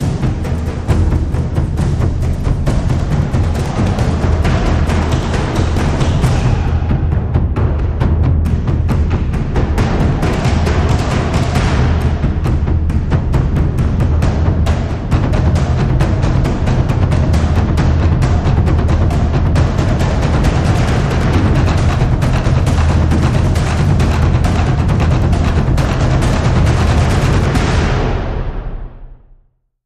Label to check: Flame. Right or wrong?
Wrong